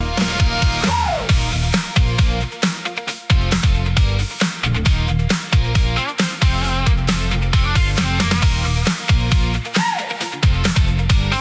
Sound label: loop